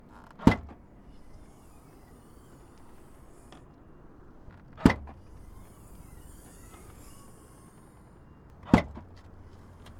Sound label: Nissan Qashqai I 1.6 Foley EXT - trunk open; mono; Soundholder